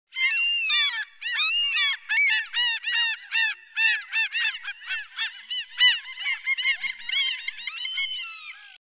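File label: common gull voice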